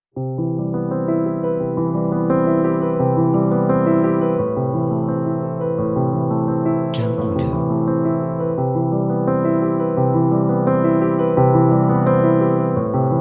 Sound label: Art Piano Documentary